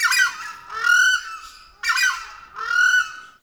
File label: Forest Bird Group; Ambience; Warframe; Grineer